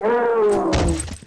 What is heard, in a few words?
Hound Die